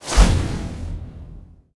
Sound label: portal